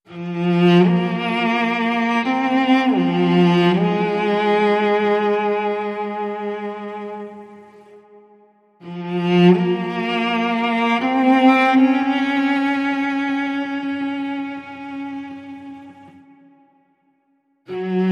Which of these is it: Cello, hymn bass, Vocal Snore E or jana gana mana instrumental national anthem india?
Cello